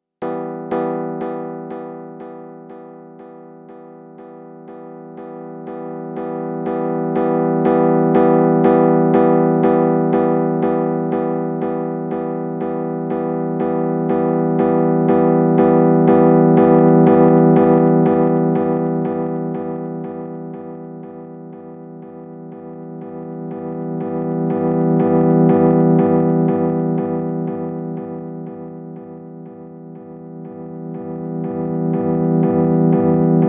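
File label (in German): Feedback Kontrolle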